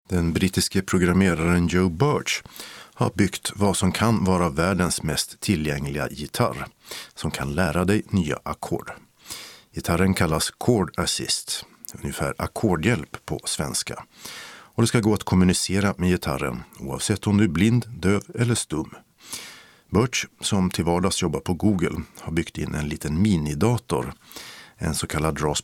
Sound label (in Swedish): gitarr